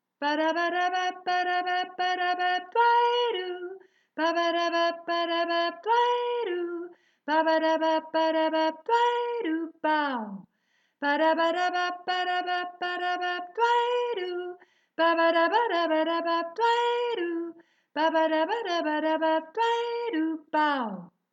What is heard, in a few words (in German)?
Hintergrund - Sopran